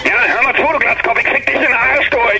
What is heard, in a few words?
ringtone